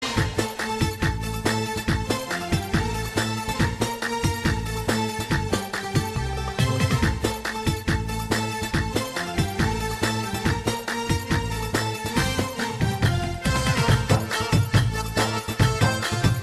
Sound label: jingle bells arabic